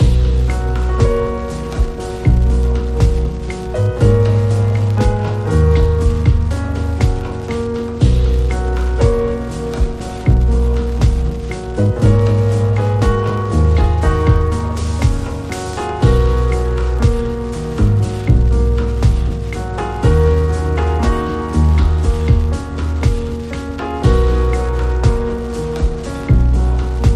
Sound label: PIANO DUB MIX